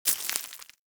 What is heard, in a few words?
Bullet Impact Debris Dirt; Warframe; Weapons; Bullet Impacts